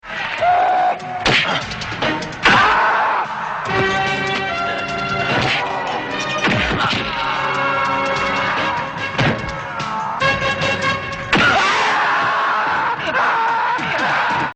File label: Aaaahhhhh Pow